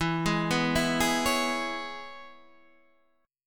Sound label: C sharp Minor 7th E, chord